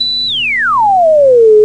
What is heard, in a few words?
sine down, electronic sounds; Sound Effects for Multimedia - Volume 1